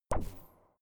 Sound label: space laser gun bubble shot hard; soundpool